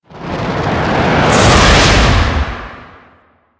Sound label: Void Comet Whiz By; Warframe; Void Storm; Railjack